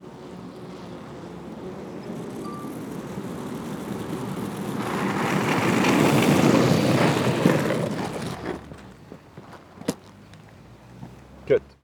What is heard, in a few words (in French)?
rollers course